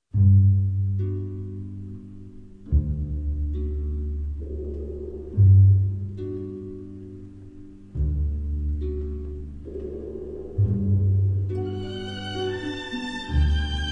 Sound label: Slovak Radio Symphony Orchestra, Gymnopedies No. 1 (arranged for orches